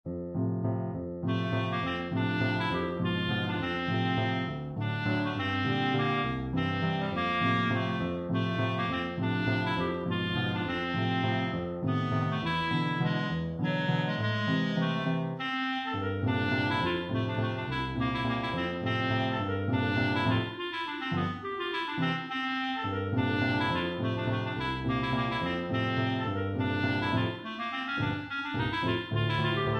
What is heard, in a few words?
Waltz clarinet and piano; Tchaikovsky; No.8 from his "Album for the Young" Op.39